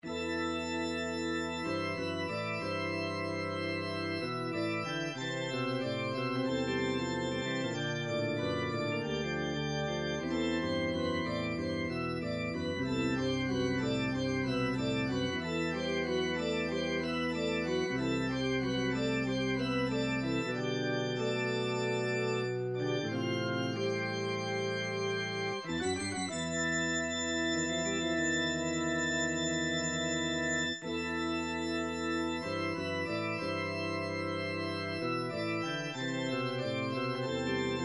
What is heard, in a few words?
organ music; super nintendo dragon ball z